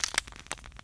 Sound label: tweezer; Mob